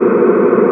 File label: SPACE NOISE; EFFECTS; Best Service Dream Experience; SF+EFFECTS